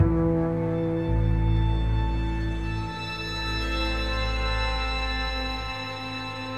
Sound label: strings lullaby, Sad; Loops